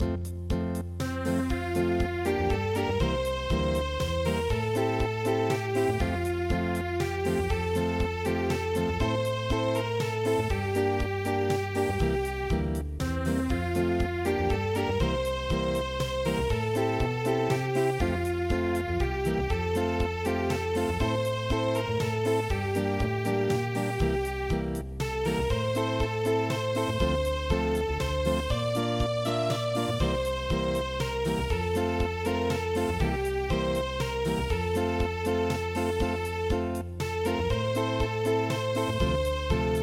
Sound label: Unplugged